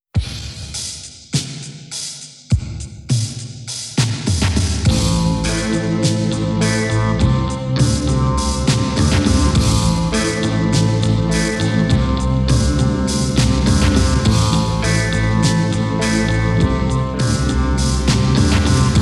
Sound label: instrumental; Rarities; demo version; rs home demo